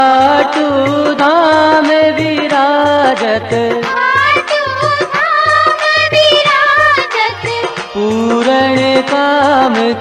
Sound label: Khatu Shyam Aarti